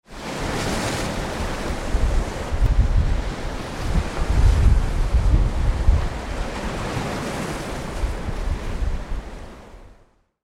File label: sea; organicsound